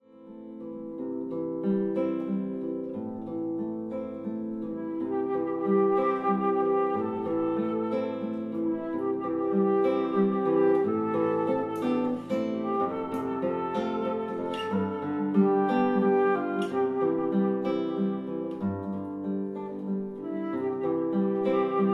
flute et guitare

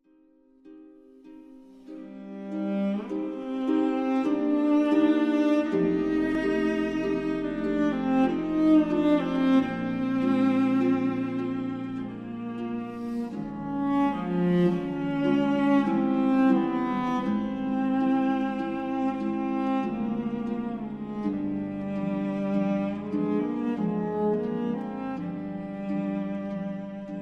violoncelle et harpe; faure apres un reve

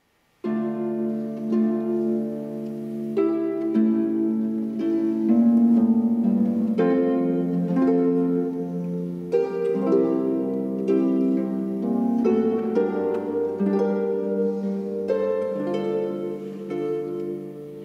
harpe sarabande; haendel

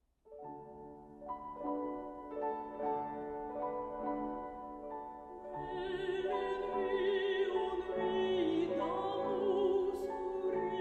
chant violoncelle piano ou harpe barcarolle; offenbach

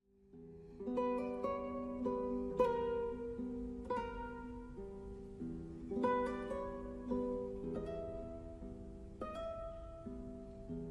gnossienne guitare; satie

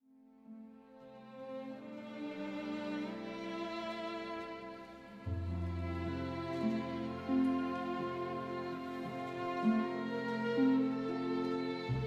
adagietto quatuor et harpe; mahler

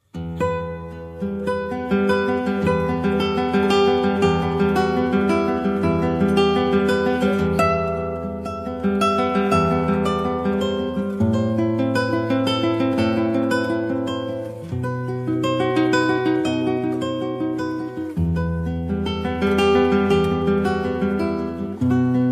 guitare

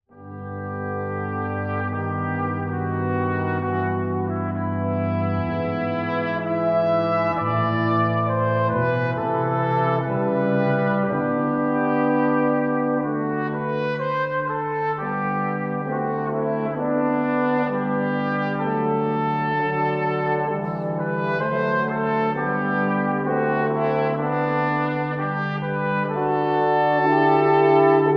quintette cuivres; franck panis angelicus

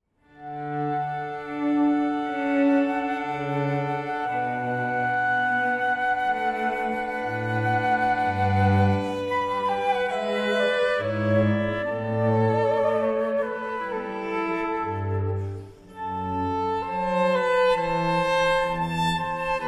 trio flute violon violoncelle, air on a g string; bach

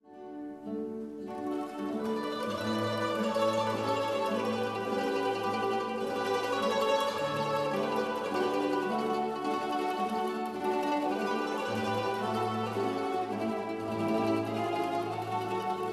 fantaise sur traviata mandoline guitare; verdi

